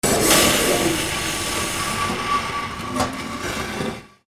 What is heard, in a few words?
hydraulic door